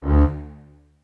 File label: cello